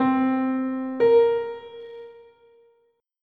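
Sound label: general MIDI minor 7th; MIDI Music File; General MIDI (type 1)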